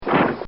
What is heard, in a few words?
Cartoon Sound Effects Splat; Cartoon Sound Design